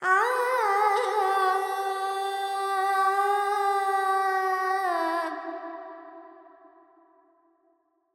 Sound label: Carnatic Female Alaps, Panthuvarali, 100 G, Verb; Sample Banks